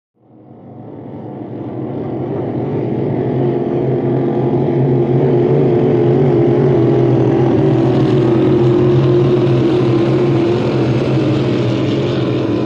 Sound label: Hydroplane By Light